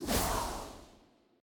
sfx joueur boost pont; shortcutrun; butchers games

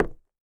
SFX Footstep

